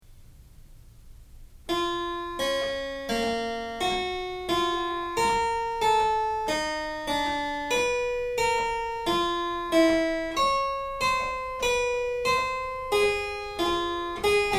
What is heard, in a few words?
fugue in b minor; js bach